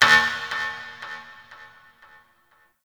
Ship Energy Knock; Warframe; Corpus Ship Remaster

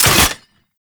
Wrist Gun Dry Fire; Weapons; Warframe; Tenno

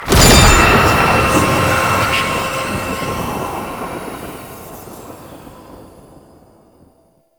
Rune Cluster Spawn; Warframe; Entrati; Mirror Defense

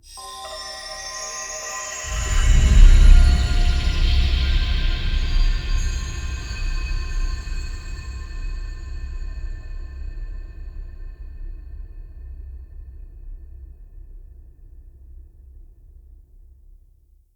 Wake Up Loop Intro Stinger; Cinematics; Bromius; Bromius Quest Wake Up Loop

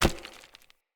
Unarmed Punch Impact Rock; Weapon Impacts; Player; Environment

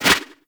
Turbulence Deflect; Warframe; Tengu

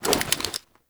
Akimbo Reload Arm Raise; Characters

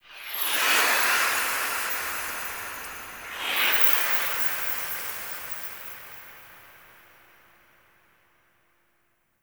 Portal; Ballas Lotus Operator Intro; Warframe; The New War; Cinematics

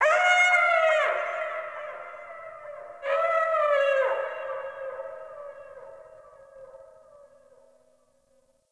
Distant Creature; Zone Four; Ambience; Warframe; Eidolon Plains

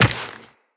Step Rock, Footsteps, Walk; Characters; Tenno

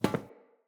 Friendly NPC Step Default; Characters; Footsteps

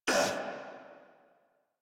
Pain Corrupted Grineer Male

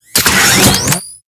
Collar Activate; Alad V; Cinematic

